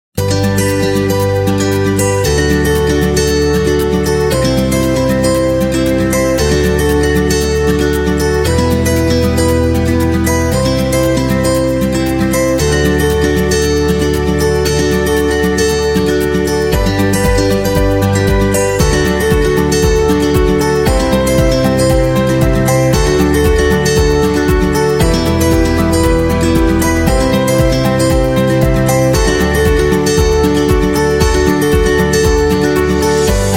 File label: Food Happy